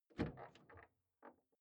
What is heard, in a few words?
car door open exterior hummer h3